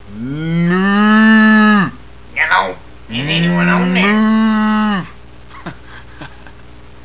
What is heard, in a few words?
moo man; hall of moos